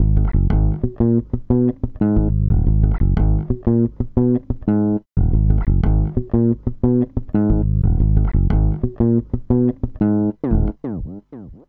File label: Hustle Bass; Zero-G Smoke